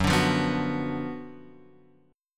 F sharp Major 7th Suspended 4th Sharp 5th, F sharp, chord; F#M7sus4#5 Chord {2 5 3 4 X 2} strummed